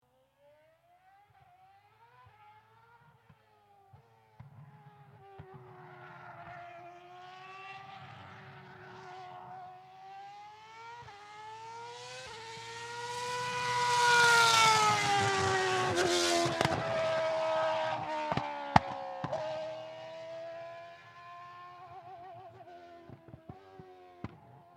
accelerate passing downshifting, Mercedes W02 F1 Car; Accelerating and downshifting while passing by.; Mercedes Petronas W02 F1 Car Accelerating Downshifting Sound